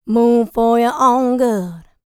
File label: licks, vocal, dance; ILIO; Vocal Planet